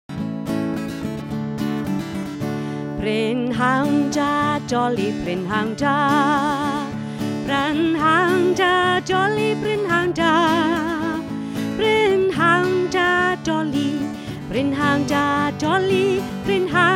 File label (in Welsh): prynhawn da doli song